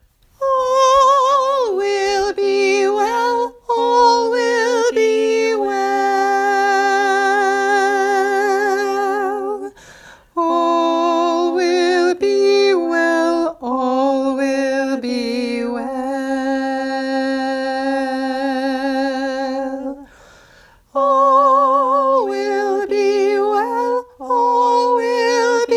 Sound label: all will be well upper sop tenor